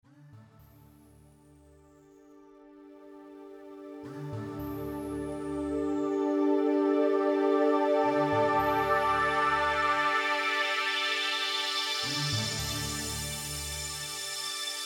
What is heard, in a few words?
ambient shark